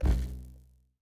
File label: Television Click; MiSide